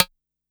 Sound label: Game Sound